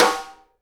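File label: SIDE STICK, Live Snares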